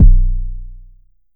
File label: Bass Kick, Jomox Airbase99, Drum Machines